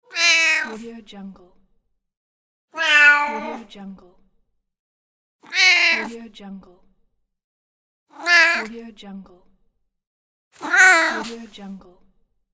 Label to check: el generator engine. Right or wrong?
Wrong